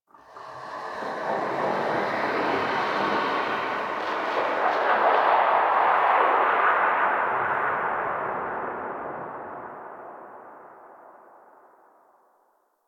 Rail Jack Exterior Earth Space Wind; Ambience; Earth Region

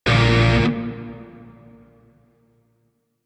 Shawzin Pent Maj Chord H; Music; DE; Warframe; Grineer Shawzin

